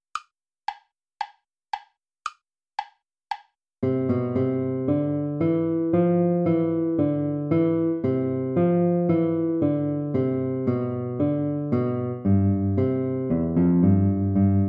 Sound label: air play along without guitar; bach johann sebastian